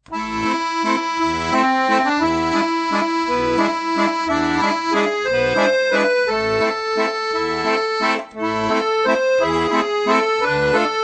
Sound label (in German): Ostländer, Akkordeon; Musikalische Vortragszeichen; Akkordeon Workshop Band 1